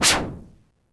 Whoosh, Korg DS-10, Drum Machines

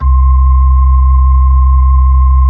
B-3, Hollow; Keyboards of The 60's and 70's

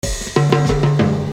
Fill; Construction Kit; HIP-HOP; Sonic Foundry (Sony Creative Software)

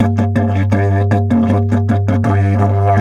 Didge 80 BPM, Didgeridoo; Big Fish Audio - Didgeridoo and Other Primitive Instruments

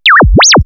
L F O 2; AMG - Vince Clarke Lucky Bastard

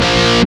GUI, 100 BPM

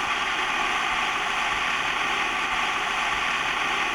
BLOW FX, tenor sax; Best Service; Killer Horns

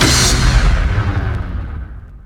FX HIT, Techno Trance; Ueberschall

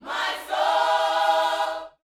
Vocal, Gospel, PHRASE; Spectrasonics

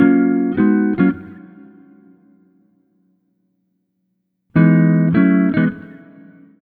Multi Guitar Swing Gtr-F#, 105; Zero-G Kocktail Kollection; Construction Kitz (Lounge)

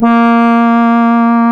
A#, Synclav FM, BRASS; Big Fish Audio

